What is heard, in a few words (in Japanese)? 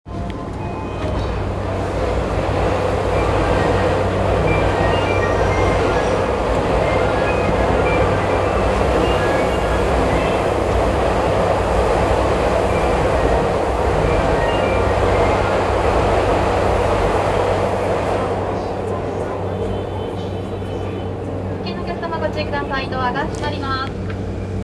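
JR山手線五反田 clockwise, train sounds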